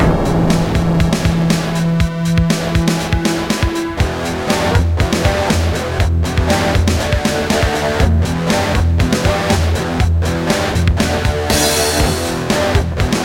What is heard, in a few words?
harp